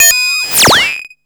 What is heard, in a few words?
SLAP BELL; Zero-G Ambient 1; ANABELL PERC